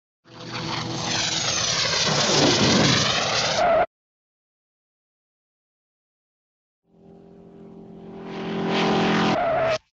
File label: highway sound ringtone